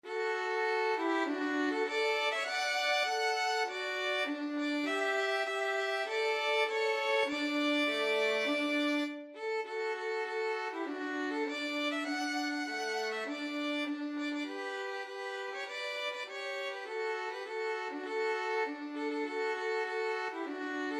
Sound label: battle hymn, violin duet